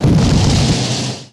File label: Big Exp Int